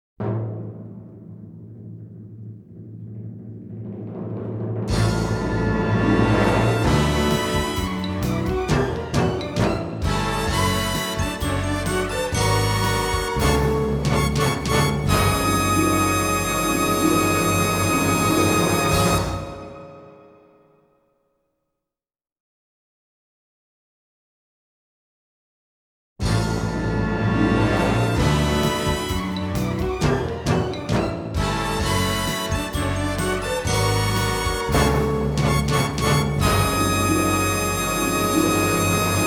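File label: Fanfare - Instrumental; Network Production Music 110 You're The Star! (Multimedia)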